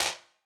Wider Clap

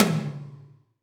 Tom Hi Can, Alesis D4

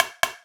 SN Heavy, E-MU Orbit-3

